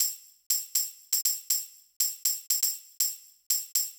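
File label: tambourine; hydrogen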